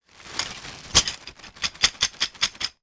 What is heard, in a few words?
foley broken dish pieces in bag movement then shake; zapsplat